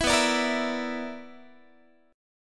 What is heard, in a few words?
B-Minor Major 7th Double Flat 5th, Guitar; BmM7bb5; strummed